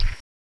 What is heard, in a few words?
step; rheptor